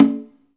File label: Bongo G, Pecussion Hits; Zero-G Jungle Warfare 1, 2 & 3; 90 s Sample CDs